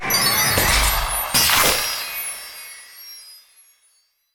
Ice Thorn Stalact

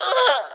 Imp Damage; Folk Hero

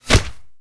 Monster Generic Foley Hit By Bullet

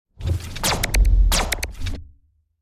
Seed Bullet; starbites

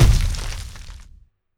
Land

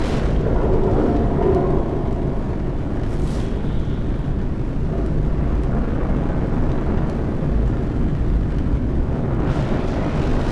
Ship Burning Loop

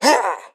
Shield Attack; Pecheneg; Folk Hero

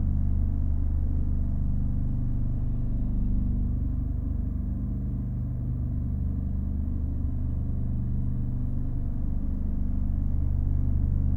Train Hum Loop

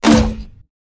Grenade Fire

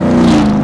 Menu drive by

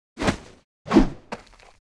Lubberkin Attack

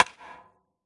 Sfx Baseball

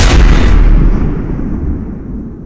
Explosion Echo; Nickelodeon Super Brawl Universe; Android, iOS

